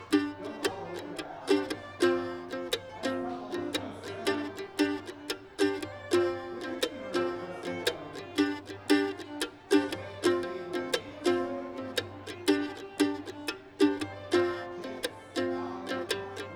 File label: mando